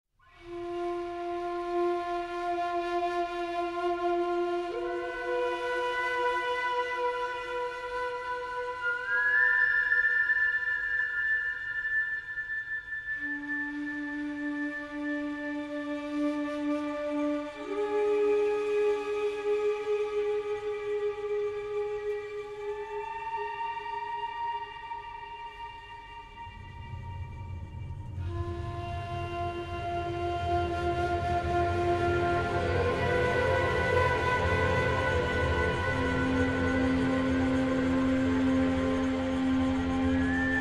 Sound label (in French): Ambiance Globale